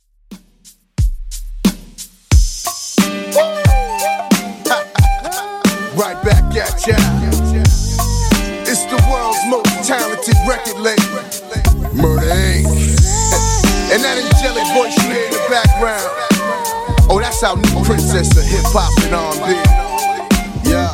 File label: 2ks Redrum 90bpm